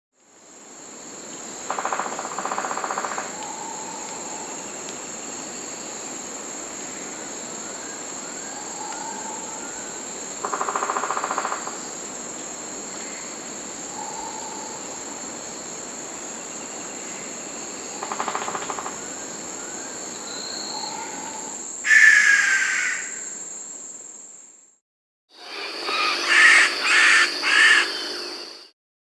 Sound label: Golden-green Woodpecker (Piculus chrysochloros), Peru, Bolivia; S. America